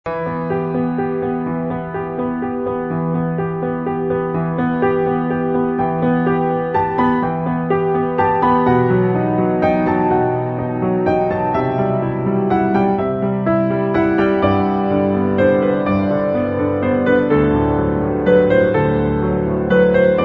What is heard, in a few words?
piano piece; barfi